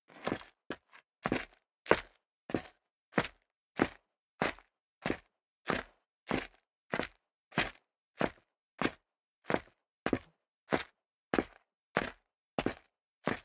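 step dress boots concrete grit downstairs sequence, footsteps; schritte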